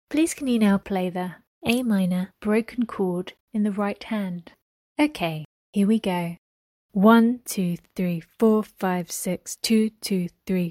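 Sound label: a minor broken chord rh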